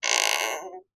household door creak weak quiet; zapsplat

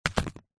papadum set down stack on another

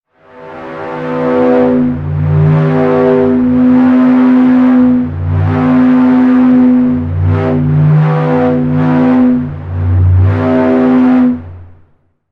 sound design effect air pipe pressure

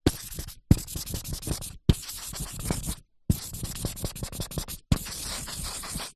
office marker large draw scribble whiteboard

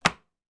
pack of 12 AA batteries put down on table

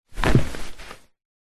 household mattress sit down on; zapsplat

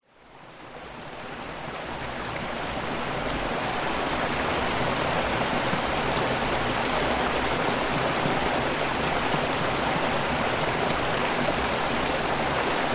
Small Running River; west wolf

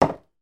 salt or pepper grinder place down on table

